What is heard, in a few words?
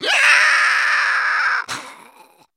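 Monster Creature Growl, foley monster vocals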